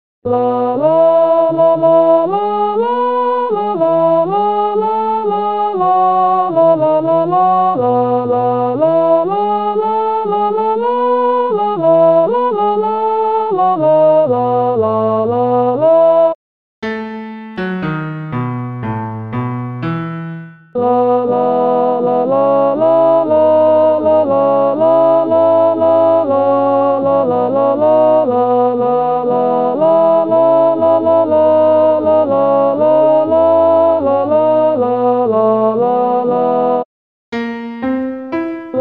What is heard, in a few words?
Alto; Avondale